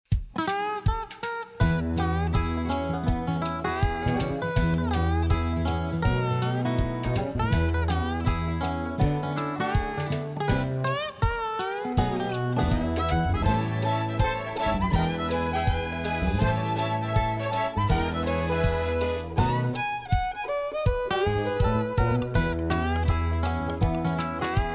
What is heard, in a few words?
country chill out